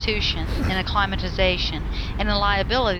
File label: noisy